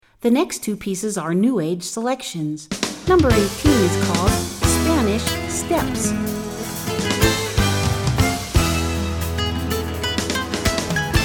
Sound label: Spanish Steps